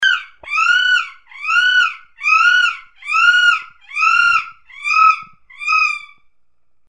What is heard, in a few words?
bird